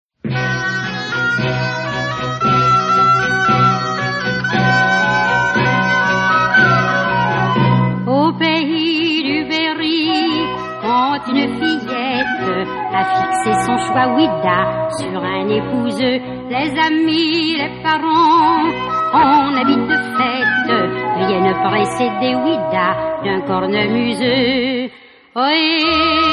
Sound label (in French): Chant